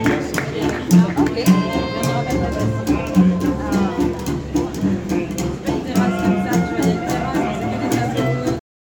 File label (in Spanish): Musica Caf Par